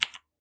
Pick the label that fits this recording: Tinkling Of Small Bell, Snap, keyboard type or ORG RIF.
keyboard type